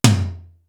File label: TIM TOMTOM; East West; Global Sounds